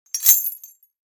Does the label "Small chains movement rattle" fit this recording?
Yes